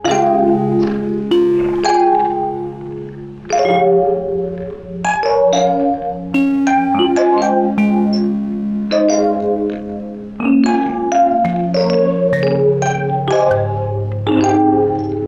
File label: main